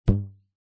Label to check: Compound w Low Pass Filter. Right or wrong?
Wrong